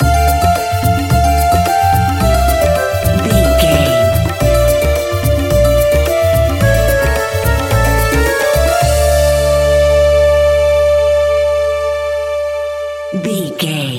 arabian music bed stinger, An exotic and colorful piece of World music. Groovy, powerful, exotic and wild